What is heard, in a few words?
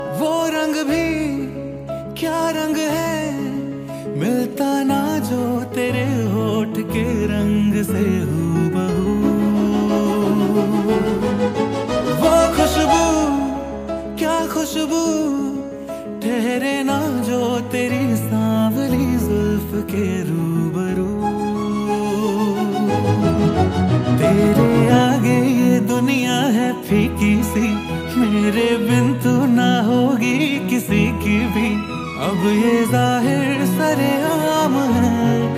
Bollywood Song Ringtone; Zero